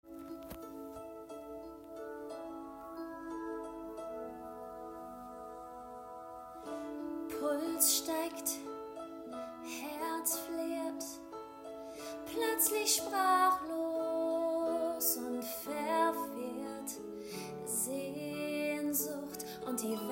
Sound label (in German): Gesang, Musical Ballade, Vocals, German; voice